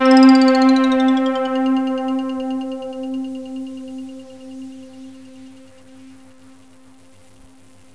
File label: Ice Rain; Synth SFX; GMTones